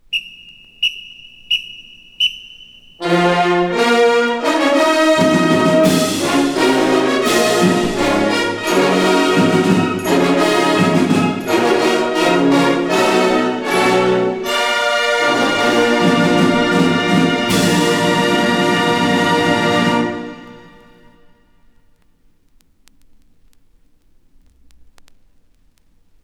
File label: Traditional Fanfare